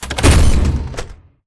footstep crouch close; Titanfall 2

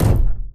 Footstep Jog Mid; Titanfall 2

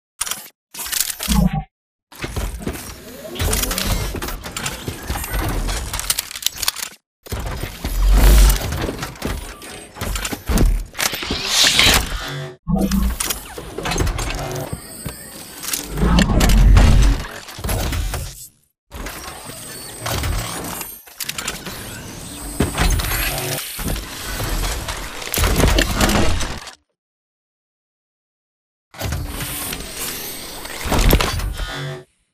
Combat Idle; Titanfall 2

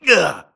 grunt hits; Titanfall 2

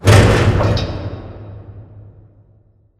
Prop Warehouse Prop Rack Stop Dist; Titanfall 2; Boomtown

